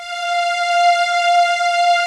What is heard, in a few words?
F5 SLO LO, KEYBOARD; AKAI S6000 CD-ROM - Volume 1; Piano